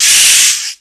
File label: Percussion, Drum Machines E-MU Pro-Cussion